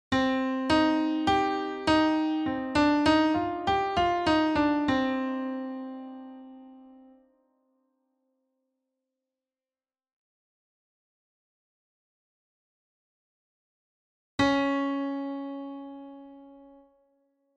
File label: minor triad and pentascale